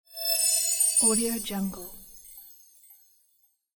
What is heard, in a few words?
shining